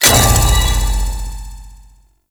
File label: Pre Death Start; Warframe; Duviri; Dax